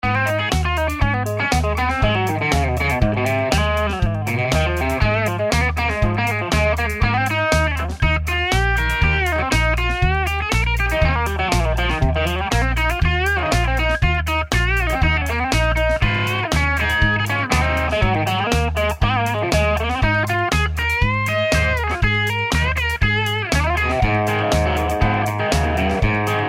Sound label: crunch bridge; schwarzbach; VP-Tele-57